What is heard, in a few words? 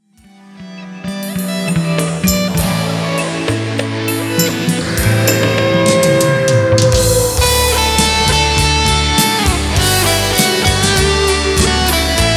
GTR SOLO